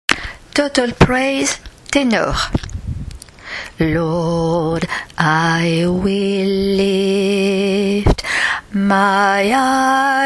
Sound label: tenors